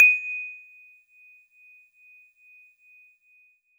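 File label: Celeste DX100 D#1; Pristine Celeste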